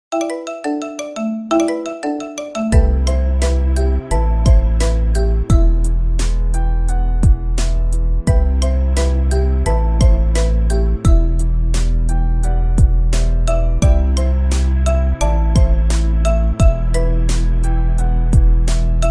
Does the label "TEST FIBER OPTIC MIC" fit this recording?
No